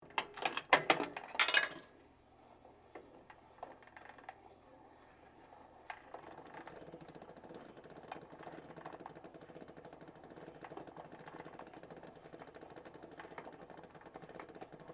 Mutoscope Run, Technology, Mutoscope, Insert, Coin, On, Run, Off; Machinery